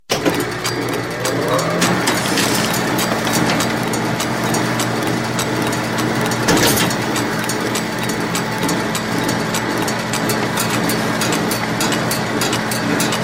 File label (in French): photocopieuse